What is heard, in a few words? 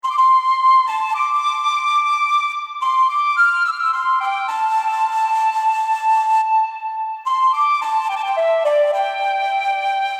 Flute; PLA; Irida s